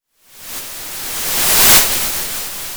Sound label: Sound of waves crashing on a shore